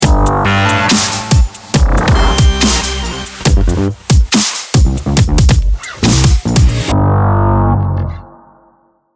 lost round, music

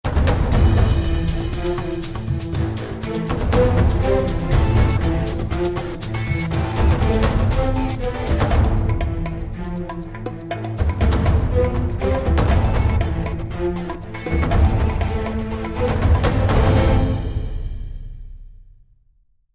round ten sec count, music; valve; csgo

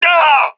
death; agents; leet epic

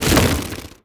buzz, antlion wings; creatures

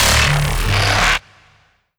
shoot warning, strider; creatures

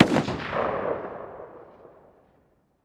m4a1 distant; weapons; Counter Strike Model Pack; CS:GO

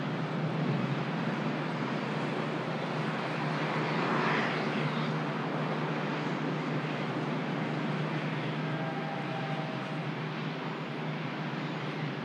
exterior, ambient; nuke

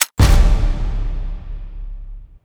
case awarded 3 mythical; Counter Strike Model Pack; CS:GO; panorama

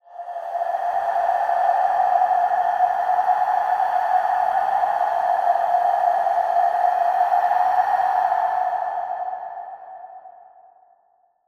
ambient skull breath; ambient air; Half Life Alyx

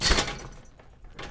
metal chainlink, footsteps; player

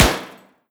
metal sheet, bullet impacts; physics; Half Life Model Pack

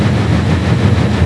fan, ambient; nuke

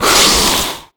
attack screech, headcrab black; creatures; vox

